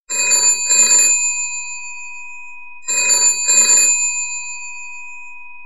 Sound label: Dring Ring